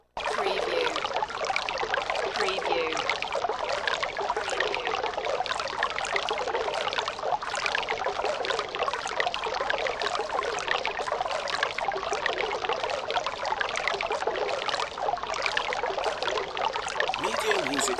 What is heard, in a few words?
Stream Low